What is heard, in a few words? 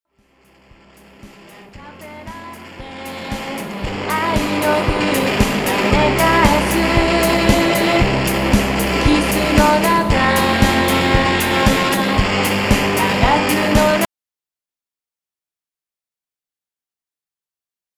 glass tank